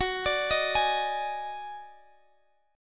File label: Eb Minor Major 7th Double Flat 5th Gb